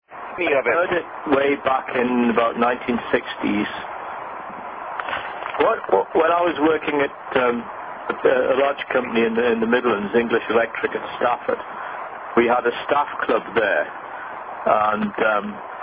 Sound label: Craven-A Context [Sung By Engineers In A Bar In Close Harmony]